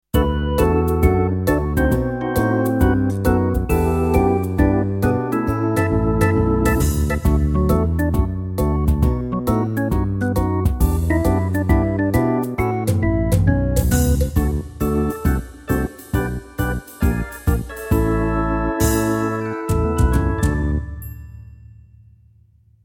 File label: Background Music, Instrumental